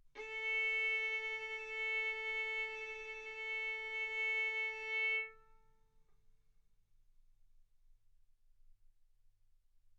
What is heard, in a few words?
Violin arco mf sul A A4 B4 stereo